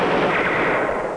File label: ambush; America Invades! Demo